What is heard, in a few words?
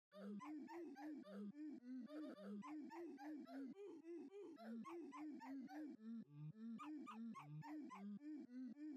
Jingle Bells but Oof